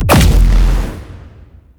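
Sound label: Pod Shotgun Projectile Explosion; Warframe; Weapons; Tenno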